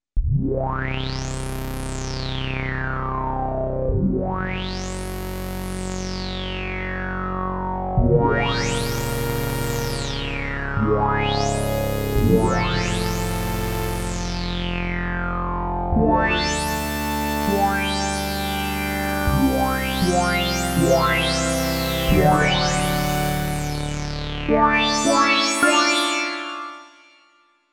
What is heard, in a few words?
Reso Synth, Oberheim OB-8